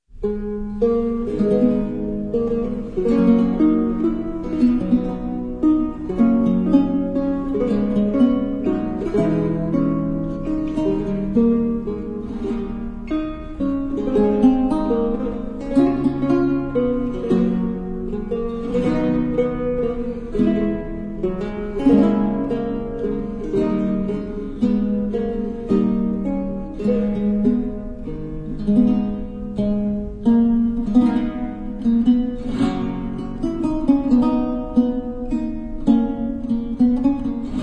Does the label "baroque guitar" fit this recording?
Yes